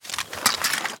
blasters deathray foley undeploy; draw; garrysmod; arccw republic z6

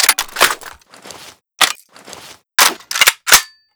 m2 reload, emplacement; m240b

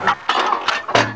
reload small shell; emplacement

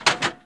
reload big; emplacement

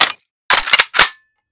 mg15 reload end; emplacement

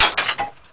reload small; emplacement

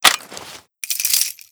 vickers reload end; emplacement

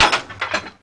reload medium; emplacement

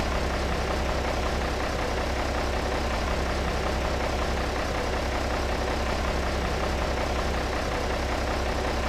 idle loop, forklift; garrysmod; sw forklift truck; sligwolf

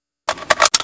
reload end, flak38; emplacement

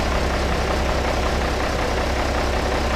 throttle off slow loop, forklift truck; garrysmod; sw forklift truck; sligwolf

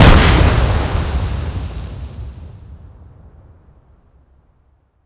explosions

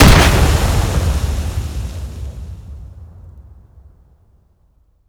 explosions

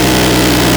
gun, m61; emplacement

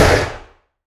stop, m1910; emplacement

